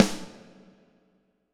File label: snare Hard Reverb